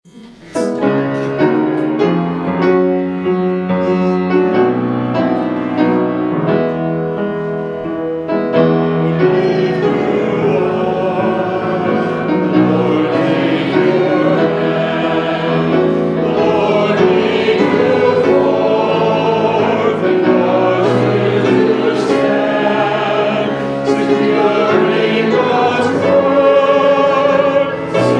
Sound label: Hymn; The Lord Lift You Up